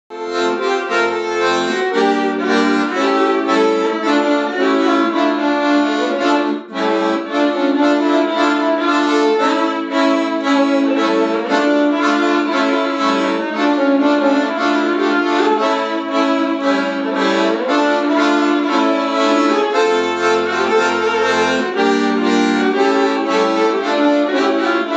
bez spevu; nit krajseho dzifcatecka

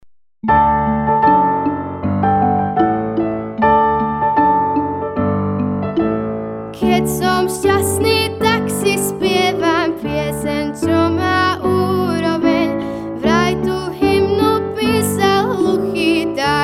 spev

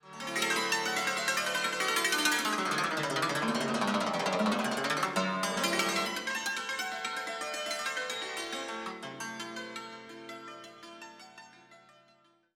cimbal

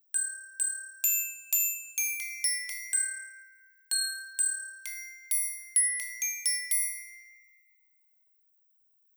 zvonkohra

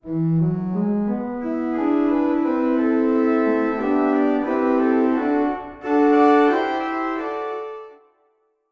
organ

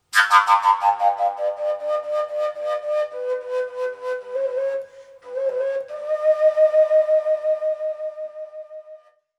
fujara

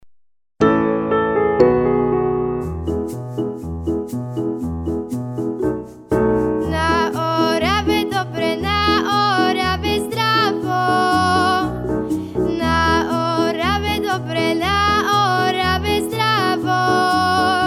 spev

